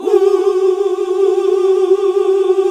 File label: GOSP. OOOHH; Sound & Vision - Gigapack II CD 1 (Roland); HAL Gosp. Oooh 2